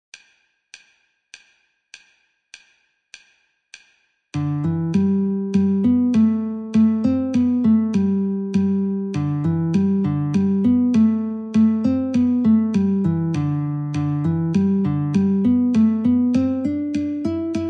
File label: Guitar metronome 50